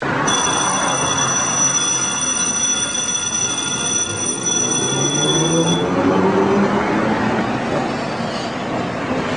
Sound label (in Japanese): densya start; aizu